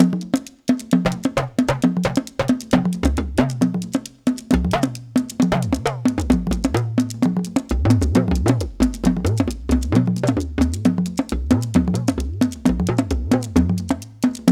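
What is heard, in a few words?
132 bpm, Ju-Ju Talking Drum Lead; Spectrasonics; Supreme Beats; African Contemporary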